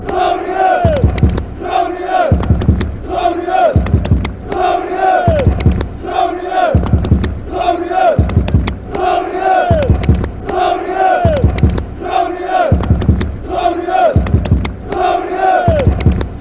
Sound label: with drum, fanchants; groningen